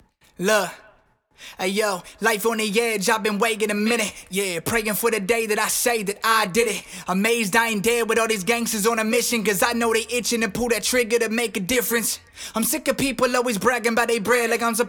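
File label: hip hop 93 B flat minor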